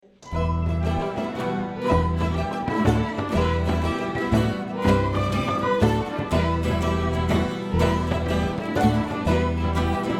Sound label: Irish Medley